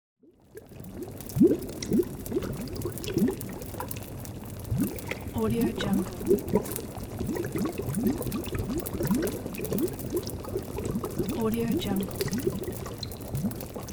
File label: witch cauldron boiling